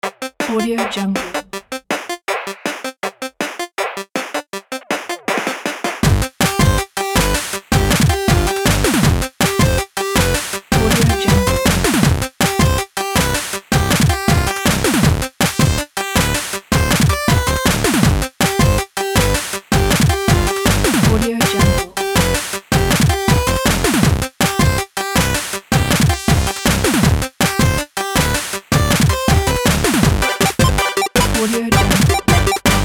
8bit fun

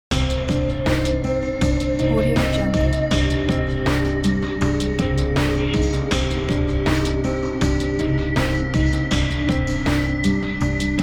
suspenseful

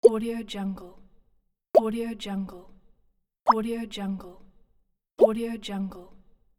bubble message

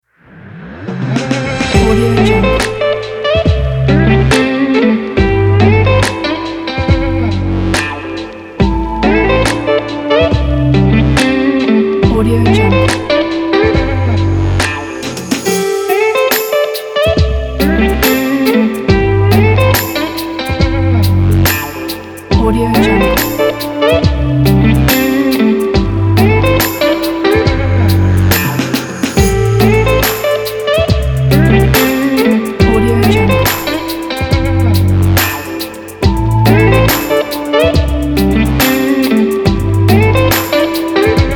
smoky sexy vibe

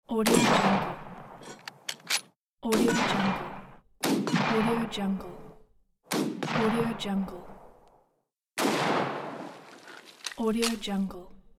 shot a rifle

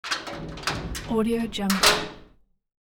cell door closes

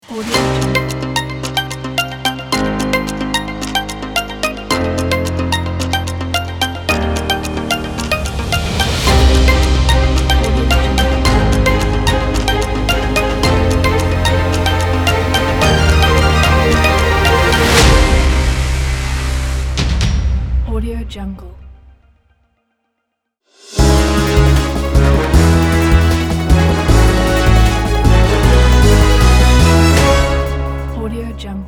breaking news opener logo pack

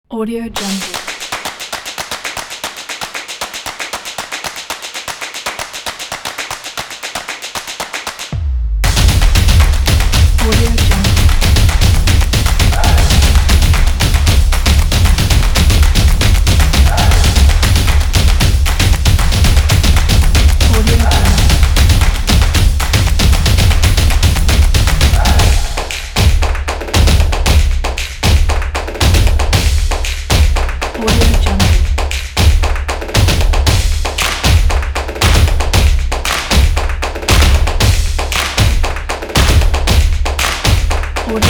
drumming

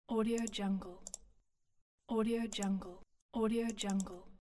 mouse click

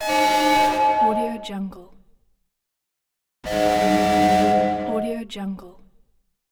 train horn

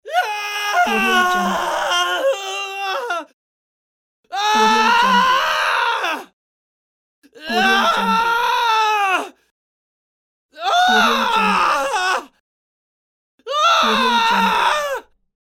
man scream

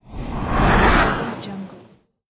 a magical whoosh

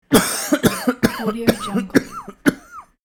cough

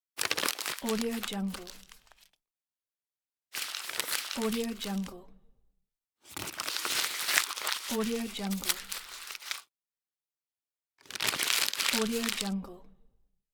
paper crumple

